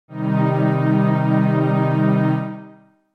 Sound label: Eb C Minor